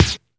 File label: Claps & Snares